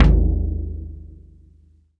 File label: E-Mu Proteus FX Taiko